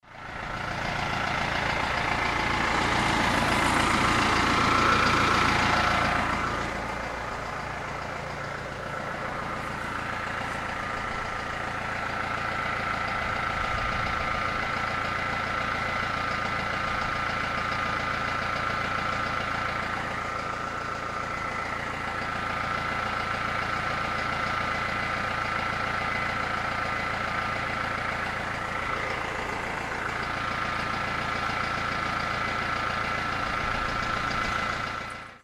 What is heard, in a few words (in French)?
Tracteur